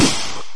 dart trap blow; svencoop

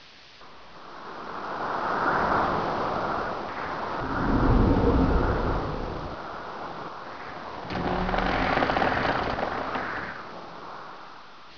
wind, ambience; cstrike

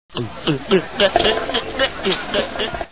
gargled

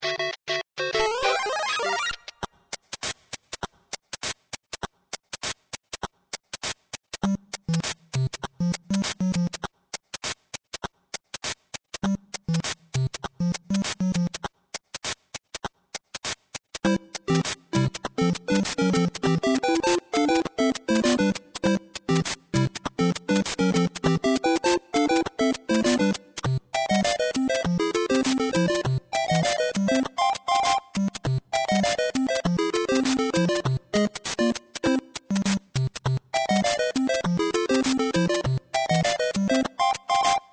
music; tfc; supermarioworld

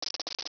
scorp scamper; svencoop

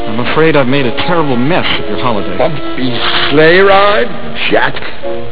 sleigh ride

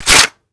saw coverdown; svencoop

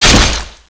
ghoul hit, claw; cstrike

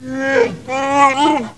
wookiee

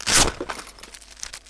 small medkit; gearbox

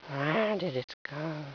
hc idle, headcrab; svencoop

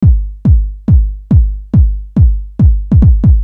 beat, party

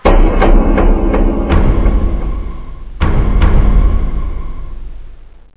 credit note; svencoop

